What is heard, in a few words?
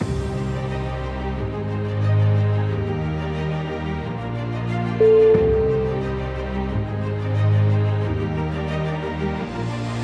exciting cinematic overture